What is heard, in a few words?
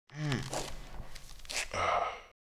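Metal Knight peace idle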